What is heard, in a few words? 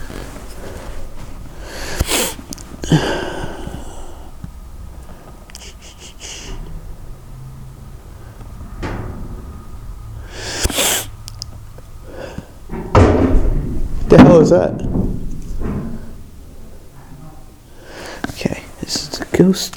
bang; Loud bang (as heard by Steve down in the basement)